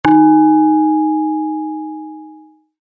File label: tone